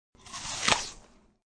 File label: Chomp of a crisp, juicy, apple; HmmmMmmm good.; Eating (Sound Effects:Human)